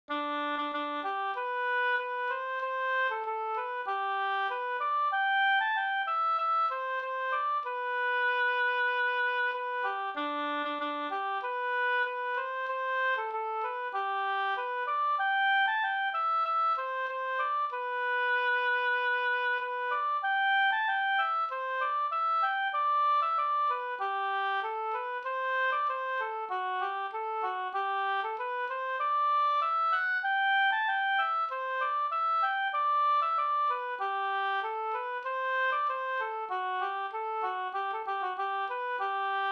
ganglat

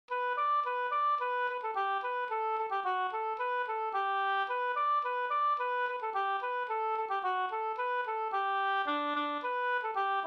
polka

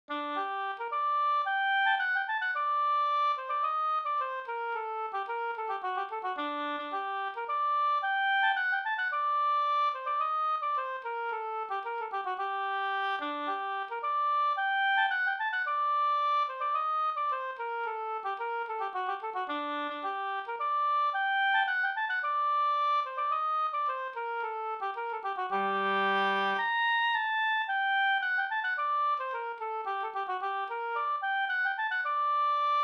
polska Senpolska

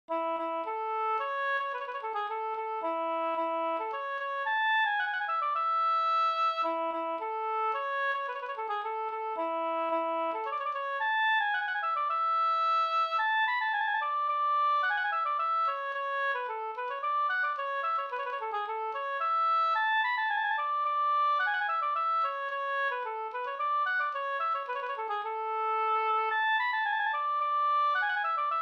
polska

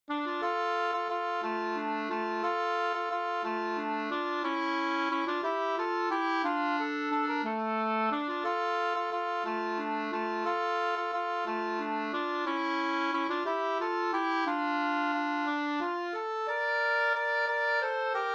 vals, d-moll

